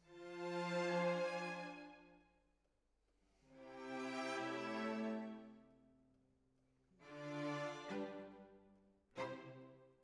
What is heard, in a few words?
Decca, Jazz for piano